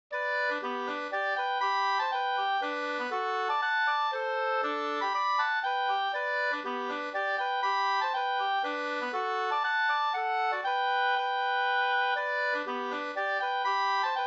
folkdans Slangpolska; fr Skafto e Ernst Abrahamsson SvFolkdD2 p15 2st V1

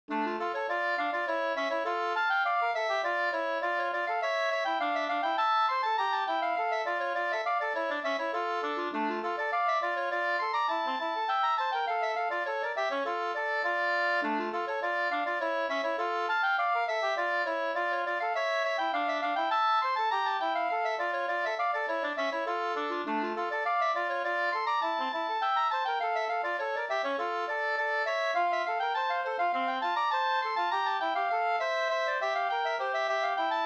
slangpolska

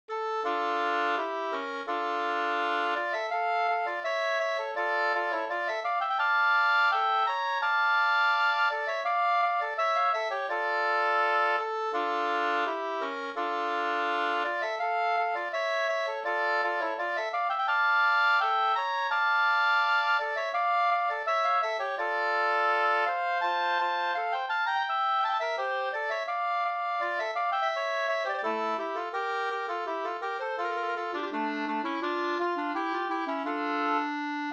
brudmarsch; fr Edsbyn

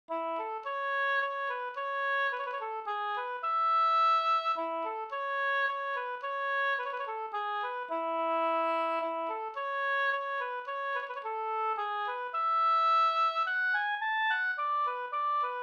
ganglat; Norsk marsch

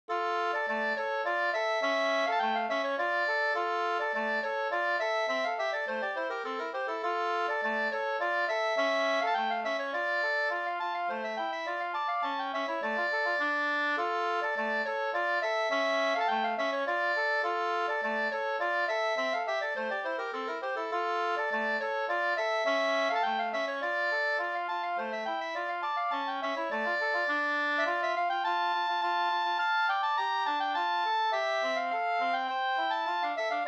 slangpolska 2st

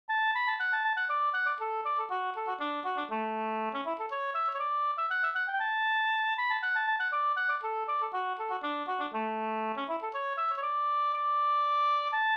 polska Bingsjopolska; Indianen e Pekkos Helmer

